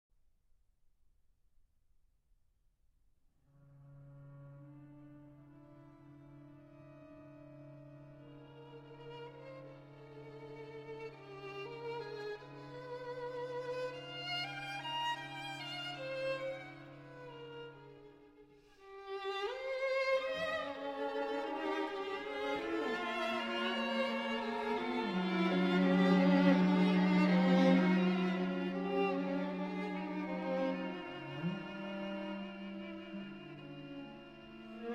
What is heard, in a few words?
String Quartet in C-Sharp Minor, Moderato